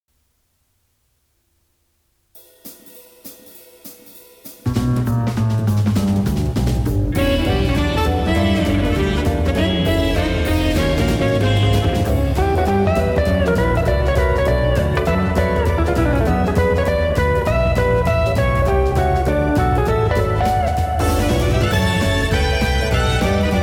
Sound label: tc-k777 off METALLIC JAZZ